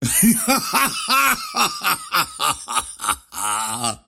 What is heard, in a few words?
wheel laugh; ringmaster